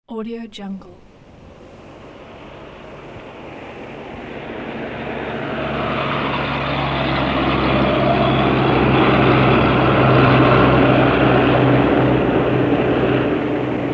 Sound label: transport truck passing by; sound-ideas